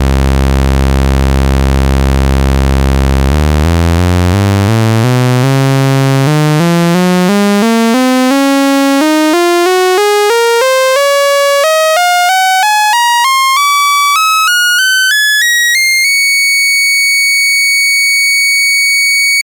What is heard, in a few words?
Saw